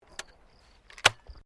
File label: SHOTGUN 12 GAUGE ZAFER POINTER LOAD, Sporting Shotguns